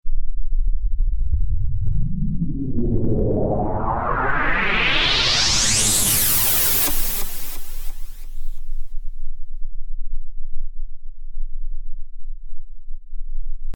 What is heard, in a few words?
Pink Noise Effix Delayed; Techno